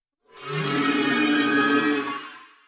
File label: deity triple scream; unsighted; enemies